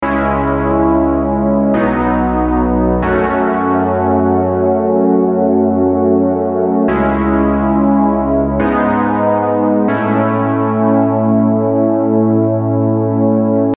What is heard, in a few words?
Chord Progression C# Major Prog; MIDI; Chord Progressions; Unison MIDI Starter Pack